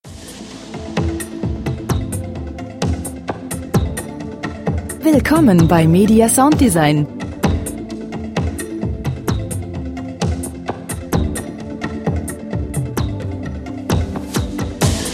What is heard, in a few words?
percussion